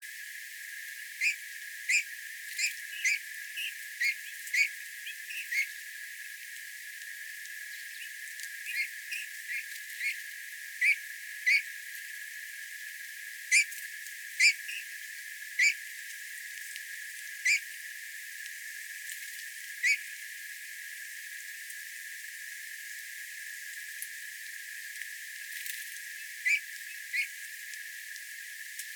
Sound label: Pyrrhula pyrrhula; SETE